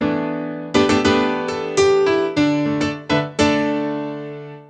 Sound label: Synt